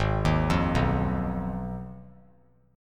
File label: Ab Minor 6th Ab, down, 3C, 3F, 3A, 3D; Abm6 arpeggiated; JGuitar